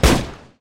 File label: fire; weapons